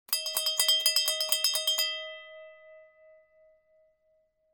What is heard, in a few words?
BELL PULL